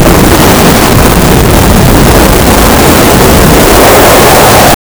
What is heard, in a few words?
a smooth airy wind whoosh